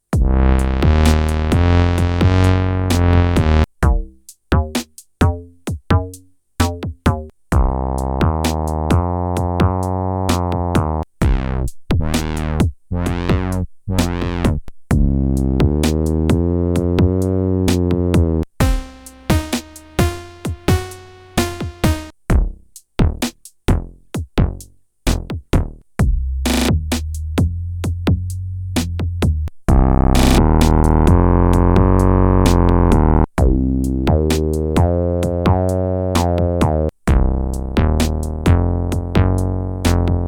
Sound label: Polyend Tracker ACD Engine